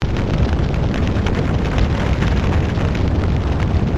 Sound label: fire